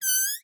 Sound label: Balloon Whistle